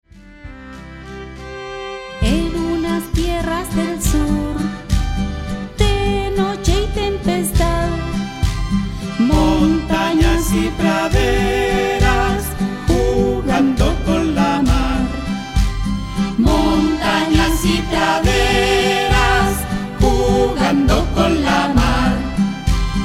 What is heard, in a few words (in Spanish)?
Vals